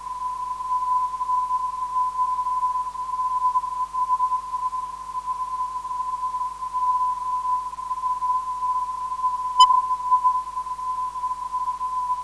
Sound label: MUK 316kHz